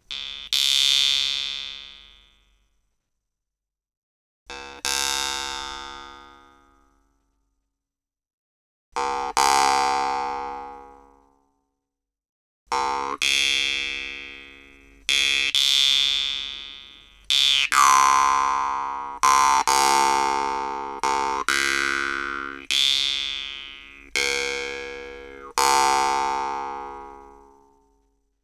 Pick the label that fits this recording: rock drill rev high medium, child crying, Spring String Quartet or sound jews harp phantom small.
sound jews harp phantom small